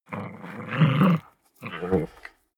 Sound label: chihuahua snarl fight soft